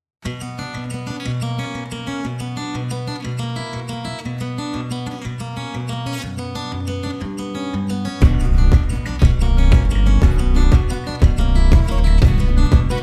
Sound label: Spanish Evening instrumental